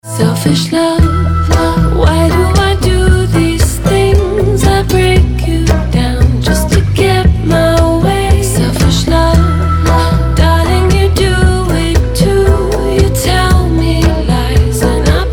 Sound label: neo soul